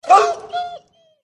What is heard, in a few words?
dog wound